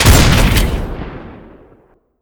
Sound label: dragon primary